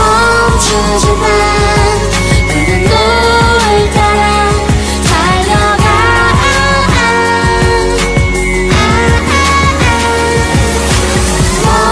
Bicycle

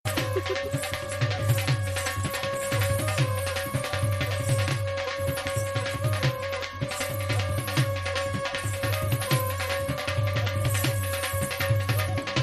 Dhol Punjabi

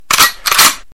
Pump Shotgun

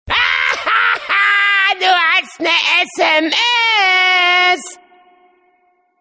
Hahaha Sms

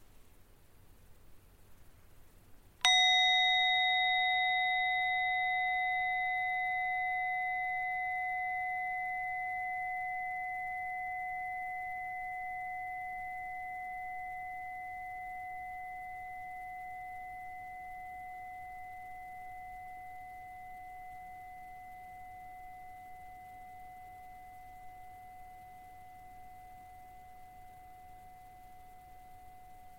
Tingsha Bell Tone

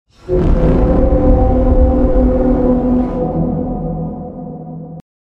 Horns; Titan

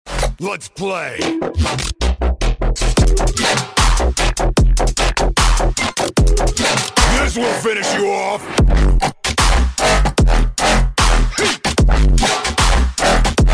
Dubstep

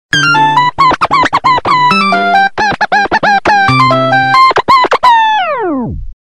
Nokia Underground

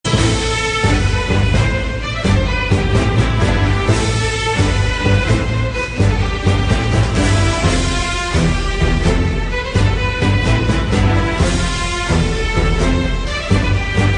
Anime remix

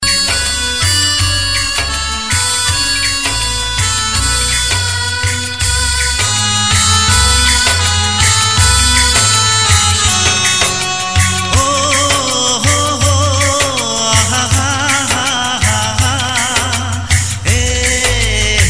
Lovly Music

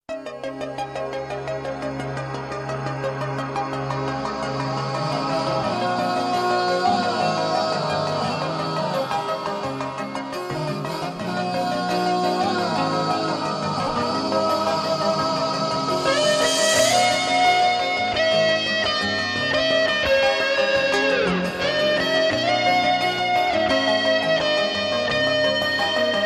Guitar; Raaz 2

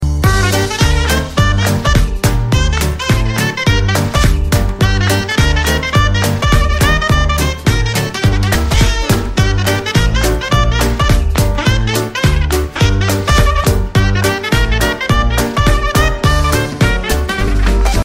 Jazz trumpet

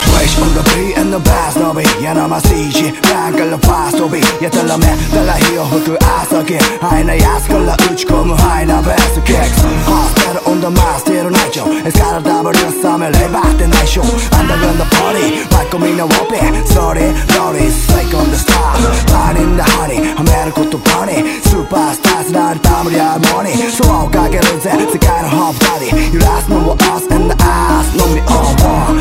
Hip Hop Japones

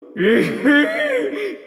Evangelist Laugh

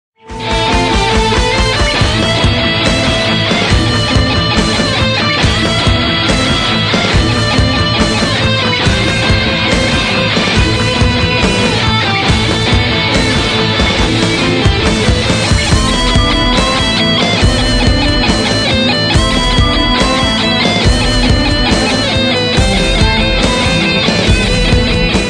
Electric Guitar

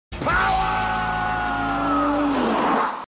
power

